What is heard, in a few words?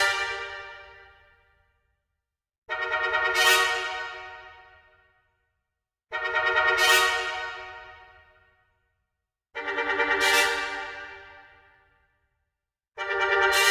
trumpet, напряжение